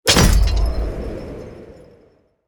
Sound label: Grenade Explosion; Krampus Trap